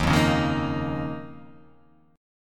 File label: D sharp 7th Suspended 2nd